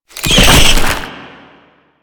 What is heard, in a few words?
Weakpoint Appear